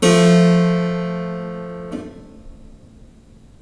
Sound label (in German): gleichstufig gr Terz